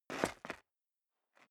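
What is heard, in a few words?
step dress boots concrete grit scuff, footsteps; schritte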